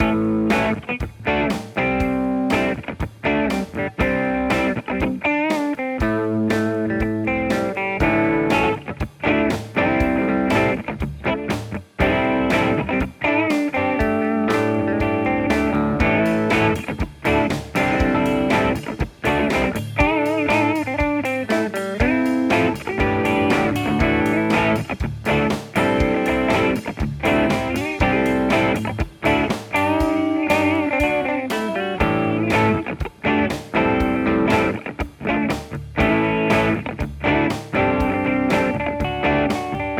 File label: Crunch Multiband; Boss GT-1000 Core